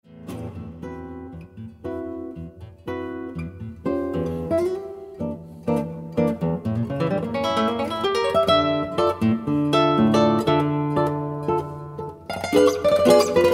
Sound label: Tango